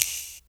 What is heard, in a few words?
Snares, Clap n Snap